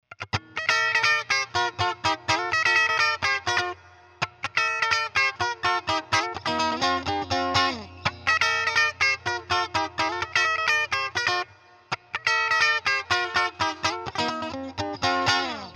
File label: S Standard Clean, ibanez s series guitars; s6570q prestige